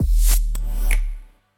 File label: sound logo; thndr